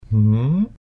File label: hmm question